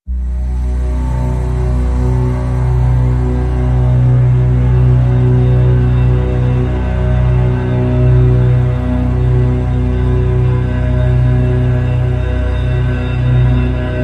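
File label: Drn B, drone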